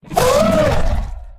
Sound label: hurt, she; combat; creatures; ryuchi; CosmicRage